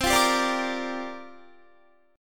C 7th Suspended 2nd Suspended 4th, C, down, Guitar; C7sus2sus4; strummed; 4F,4A,5C,5G,5C,6E